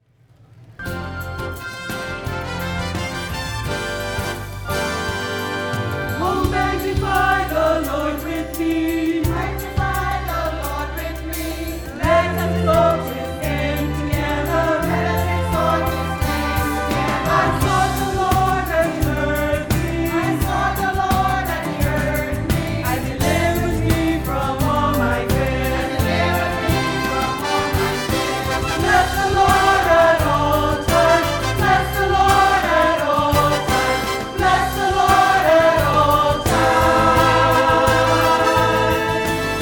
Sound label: introit, mothers choir